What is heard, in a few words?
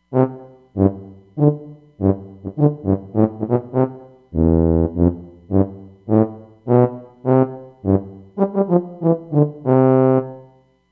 Grande Tuba